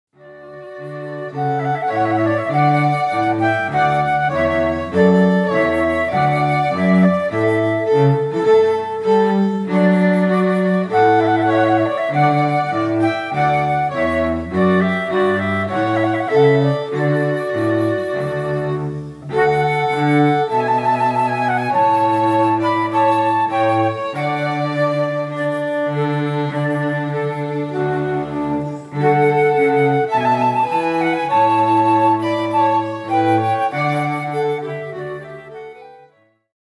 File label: Flute And String Trio